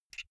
buckle plastic open soft

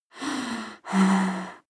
breath female moaning breath

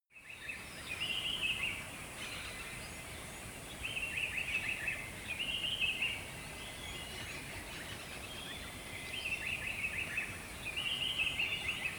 river dawn calm

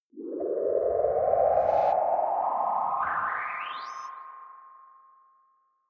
ufo take off wobble

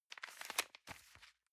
envelope swap soft

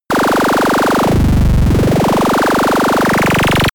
heavy machinegun

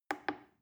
plastic button little click soft slow

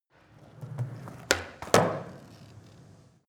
skateboard indoors jump

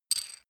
metal screw nut single

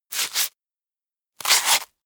scratch

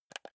console controller joystick press

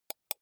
computer mouse wheel click soft

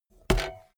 bmx bike tire kick heavy

